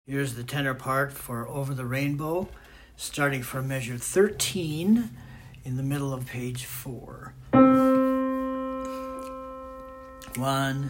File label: tenor; SATB